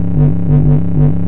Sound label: scanner scan; Source Engine; video game; npc